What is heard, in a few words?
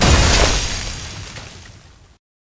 skill ice trap born